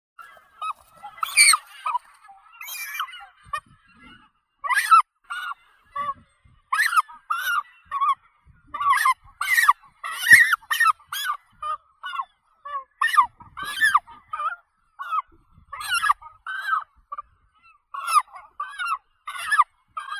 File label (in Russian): baklan ushastii phalacrocorax auritus